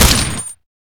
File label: FAMAS; Blockade Classic